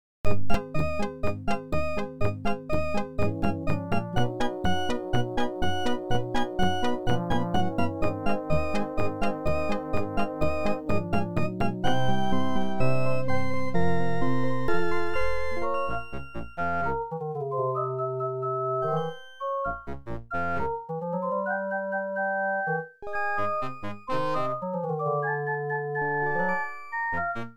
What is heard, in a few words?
Gameover